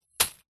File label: industrial small plastic bag of metal screws drop; zapsplat